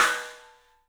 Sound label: STEEL, Drums, ROOM SINGLE; 3D Sound